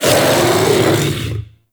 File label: Kubrow Armored Vocal Attack Medium; Warframe